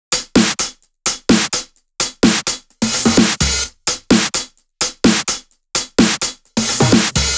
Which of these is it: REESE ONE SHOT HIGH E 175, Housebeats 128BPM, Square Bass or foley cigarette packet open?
Housebeats 128BPM